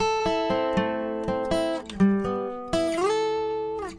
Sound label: simple acoustic guitar; GUITAR LOOPS